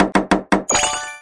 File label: building upgrade